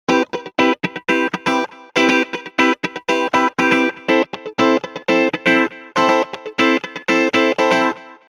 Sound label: Funk Complex Delight